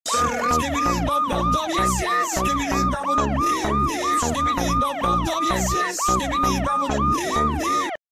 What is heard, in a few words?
police toilet